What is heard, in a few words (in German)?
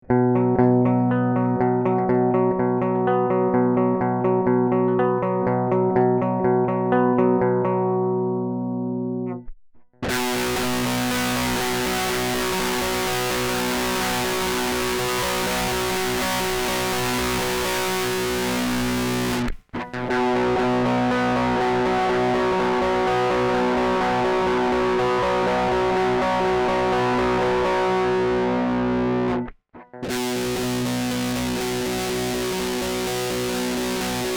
Verzerrer Gitarre 4 fach